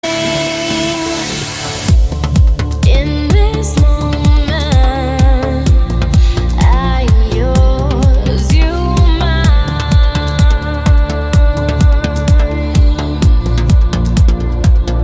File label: Electro Dance